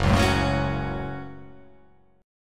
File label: F sharp Minor F sharp, Chord